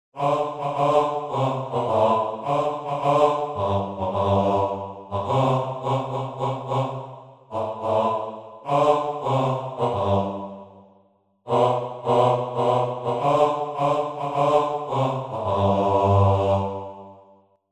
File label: Chorus Bass-Baritone Isolated; Heart of Oak